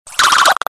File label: Alien Message